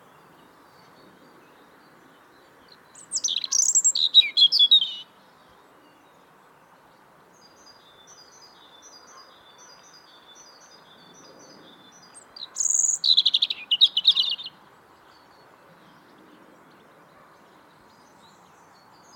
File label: European Robin Erithacus rubecula